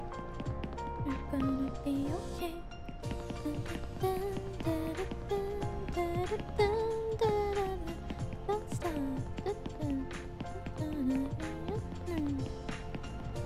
Singing humming

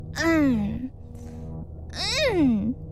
frustration noises

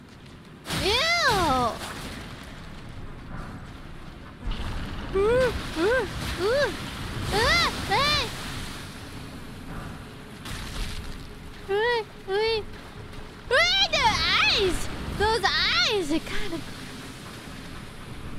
eewww

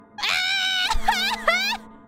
Scream; IRyScream

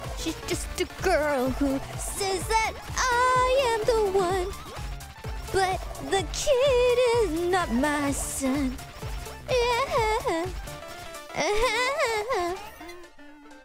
Singing; IRySinging

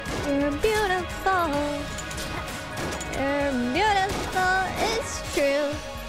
Singing; IRySinging

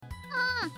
hm

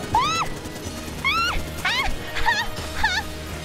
Scream high pitch; IRyScream

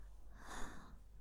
hope noises

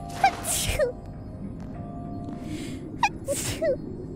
Sneeze

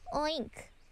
Oink

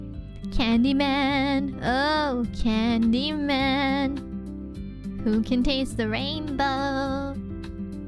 Singing

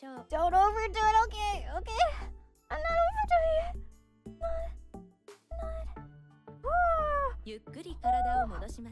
stretching

